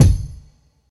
long room reverb bass drum single shot f sharp key